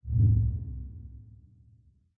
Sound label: synth; smite; chronos